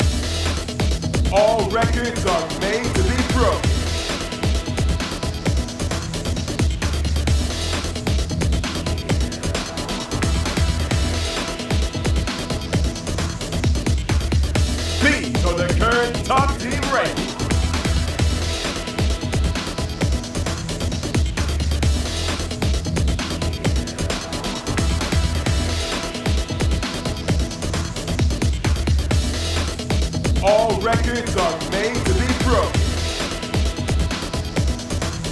Ranking Display; Capcom Fighting Collection 2; PS4, Switch, Windows, Xbox One

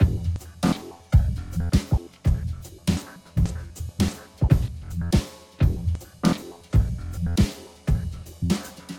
Speaker-Mic Glitch-Jazz Loop; Viewfinder

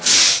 Brakes

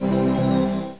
Heal Cast

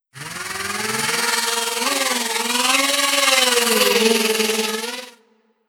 Engineer Radiant Drone

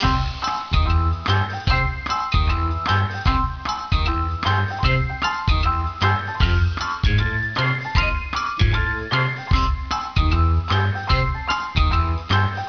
Get In Gear (Instrumental); Fisher-Price Learning in Toyland

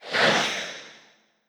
Ghost Run

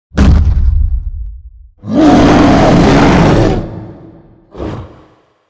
Monster Bonus Win; rampage arcade gamerip 2018; Monster George

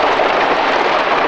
Coaster

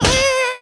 Mage Merling Damaged

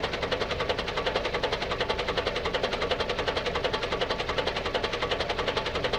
Tank Motor

